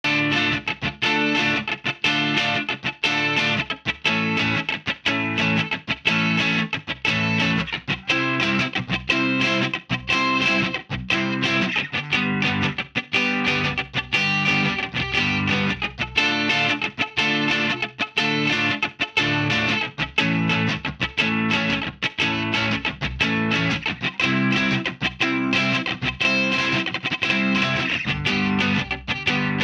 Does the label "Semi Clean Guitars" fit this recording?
Yes